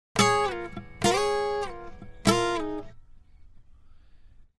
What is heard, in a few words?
101 Pop Ac-Guitar Dbmaj; Pop Rock; Acoustic Guitar; Pro Tools Essential Loops; Digidesign